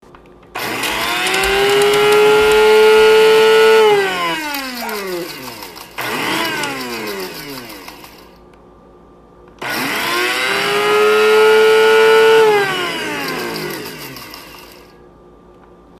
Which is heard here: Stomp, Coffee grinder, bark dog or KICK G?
Coffee grinder